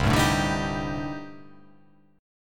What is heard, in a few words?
C Minor 6th Add 9th C